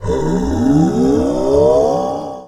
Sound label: Scifi Alien Energy; Energy & Forcefields